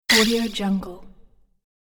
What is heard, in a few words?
snotty little monster gets killed; sonic-boom